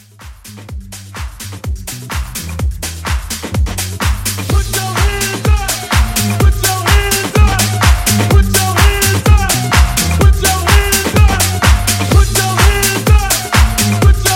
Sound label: House Mixshow